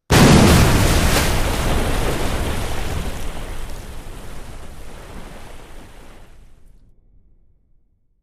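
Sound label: Explosion Water Splash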